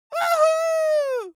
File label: creature dwarf cheer wuhu